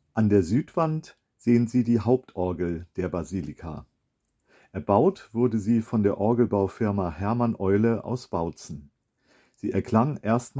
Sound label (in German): Orgel; Station 2; lichtorte in der evangelischen kirche zum erloeser